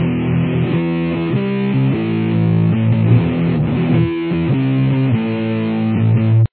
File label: chorus, guitar